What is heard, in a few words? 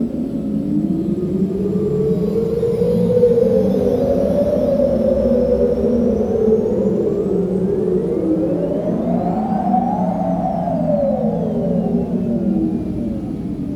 ambience